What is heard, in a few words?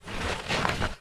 rustle bag; prestibags